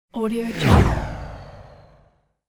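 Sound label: racing car passing from opposite direction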